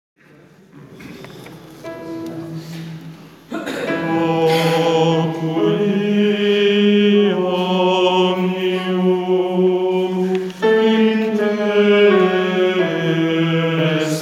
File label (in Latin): Oculi omnium Basso; repertorio polifonico